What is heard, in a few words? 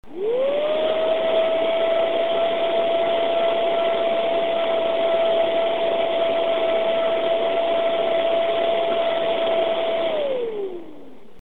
cpu radiator